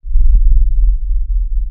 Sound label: Deep bass